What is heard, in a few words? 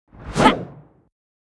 Paladin Attack